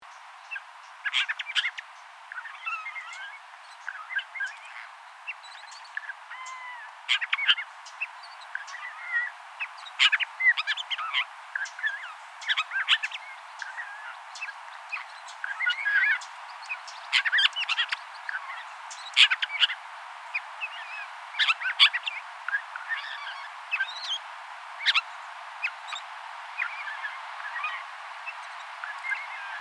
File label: Canada Jay, Perisoreus canadensis obscurus; jays and crows